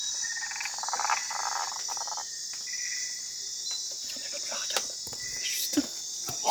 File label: Osteocephalus helenae